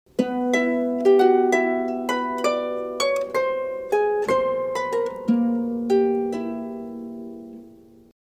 harpe

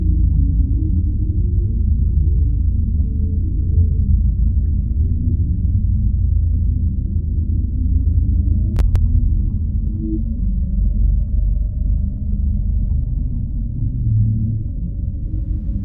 Ambiance salle mission

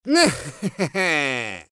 rire

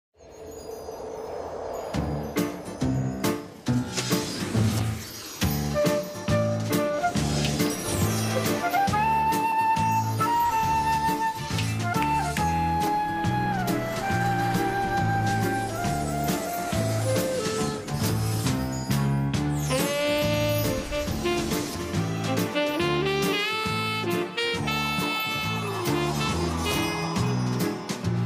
Generique; Josephine Ange Gardien

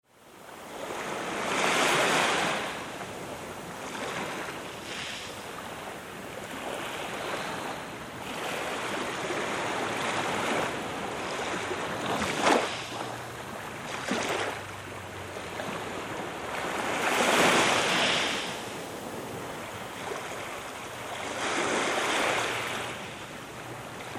ajaccio plage mer calme

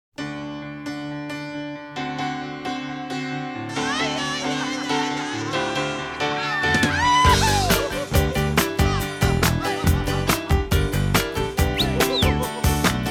jeu intro

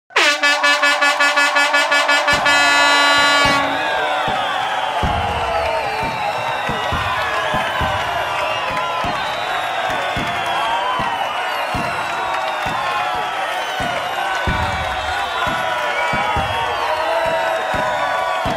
bruitage foule en delire

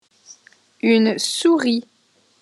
souris

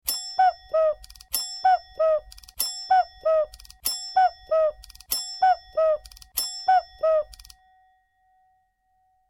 coucou horloge

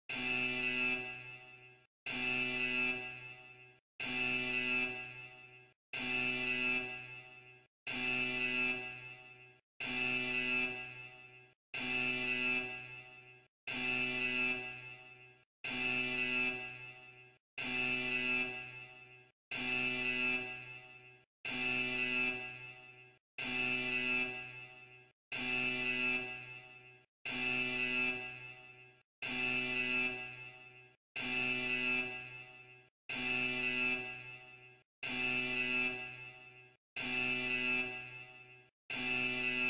Alarme

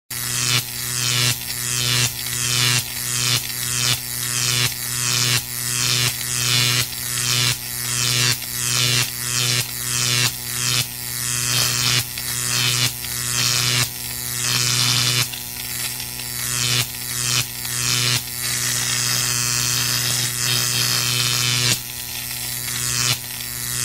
bruitage electricite

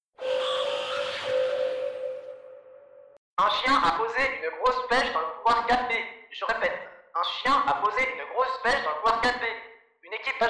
chien; grosse peche

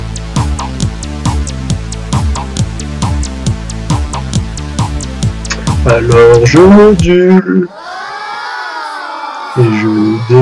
la chanson de la modulation

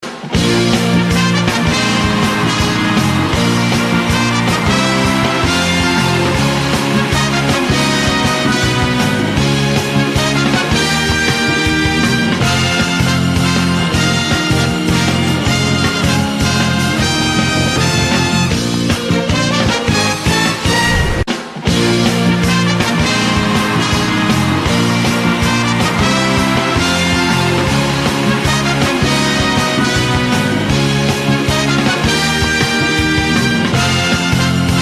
Générique; Miss France

